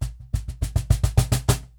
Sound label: Cajon Fill; musicradar